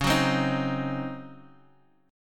C sharp Major 7th Suspended 2nd Suspended 4th Guitar Half Step; C#M7sus2sus4; 10,10,11,9,x,x; Half-Step Down